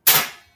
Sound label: magnet pew